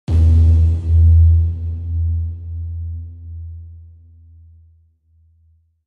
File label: Wash Elect Buzz LFE